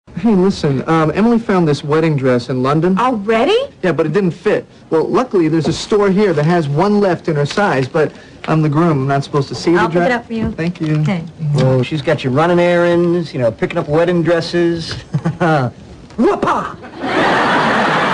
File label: woopah